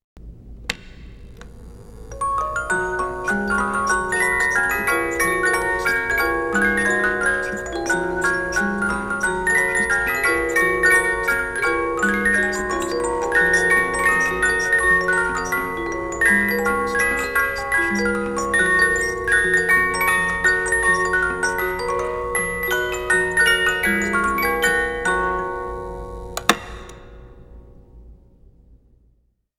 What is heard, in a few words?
Music Box